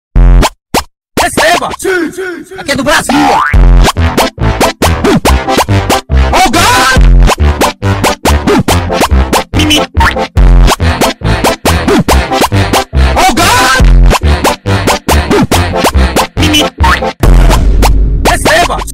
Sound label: Brazilian Phonk